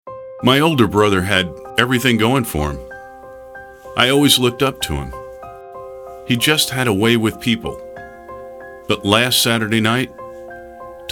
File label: drinking